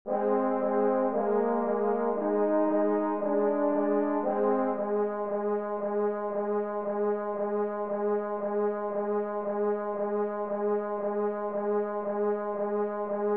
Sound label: F horn part